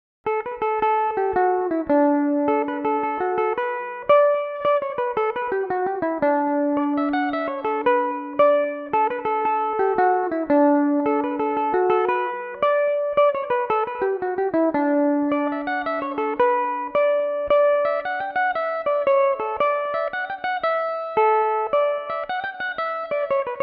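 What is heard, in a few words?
irish lilt D major, irish mandolin; Belmuse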